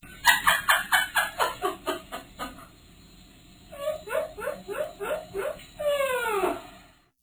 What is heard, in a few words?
Buzz Laugh